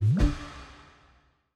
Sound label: Reel Bounce